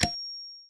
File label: Goggles; in the line of duty firefighter pc 2000